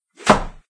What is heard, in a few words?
Platform Slap; Doodle Jump 2